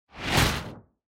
Motion Slam Dry

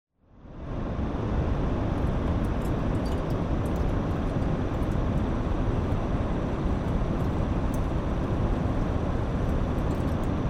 Air Conditioner (Noise High)

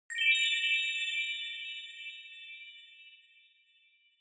Bell Accent Delay

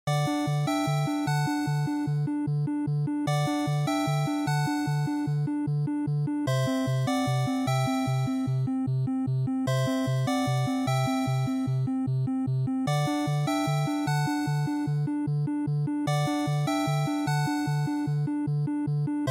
NES Shooter Stage

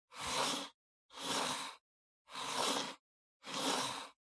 PC Mouse Move Wood Long

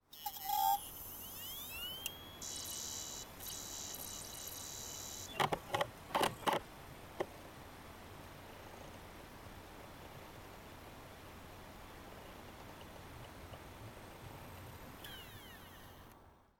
Optical Disk Drive Operating Noise

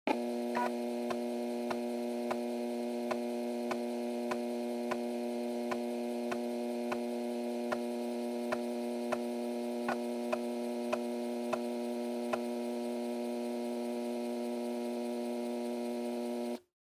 Floppy Disk Drive (Operating Noise)

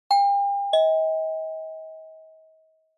Doorbell Mech Tone, Close; ドアベル 機械式 トーン01-2(近い)

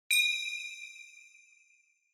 Single Accent High

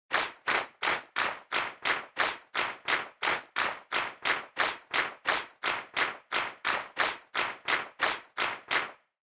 Handclap Dry Fast

